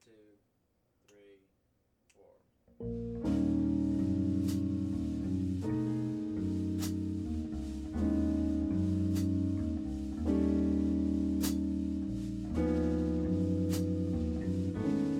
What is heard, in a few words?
jazz